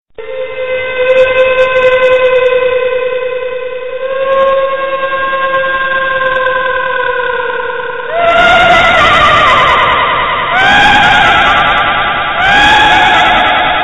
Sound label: Scary Creepy